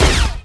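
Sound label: medic attack; lmctf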